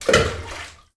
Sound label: water splash flooded bunker; sound effects one